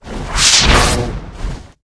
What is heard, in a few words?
moscutter queen attack, monster